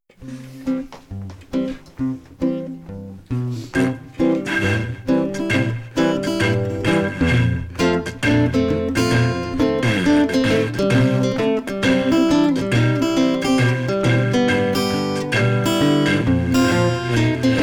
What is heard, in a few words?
jug band music